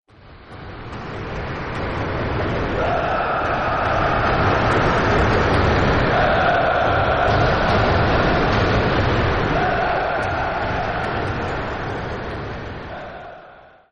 crowd Chant General, football

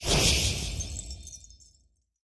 win Line Flash; fairyred

